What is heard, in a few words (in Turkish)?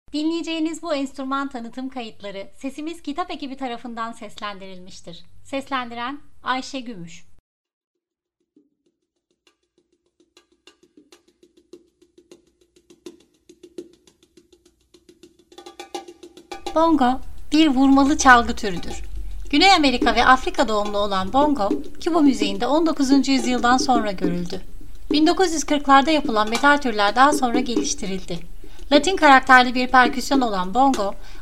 Bongo; ayse gumus